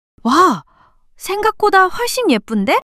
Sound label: surprise